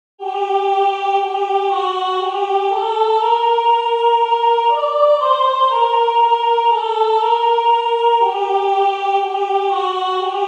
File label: soprano; belle qui tiens ma vie